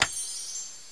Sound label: Click; BG2; Msstyles; Styles visuels